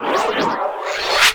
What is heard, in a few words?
Fire Spear Cast; Warframe; Nezha